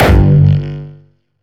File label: Hard style kick